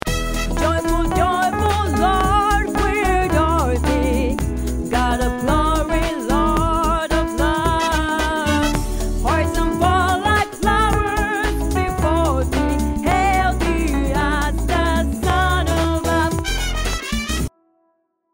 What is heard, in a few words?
Joyful B Voice Piano Alt; christmas